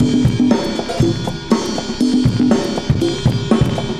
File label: cooling 120, loops, 120bpm